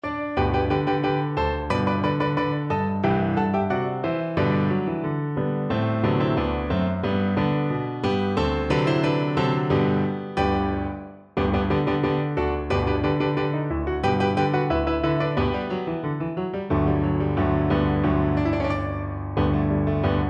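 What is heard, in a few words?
VLC karaoke, Cello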